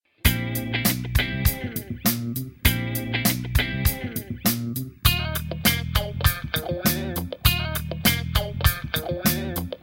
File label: Instrumental